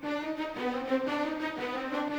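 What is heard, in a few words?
Railway loop; East West; Symphonic Adventures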